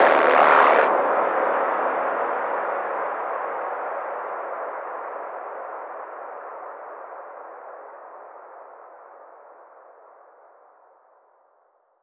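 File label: Blast Extinguish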